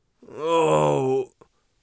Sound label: tummy hurt, warrior voice; Warrior Voice Pack